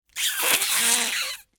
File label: cartoon blow squelch splat